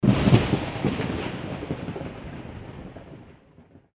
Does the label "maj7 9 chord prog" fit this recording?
No